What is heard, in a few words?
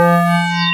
transmultihollow, single cycle waveforms, transwave, emax; trans wave 128 single cycles lond 256 samples size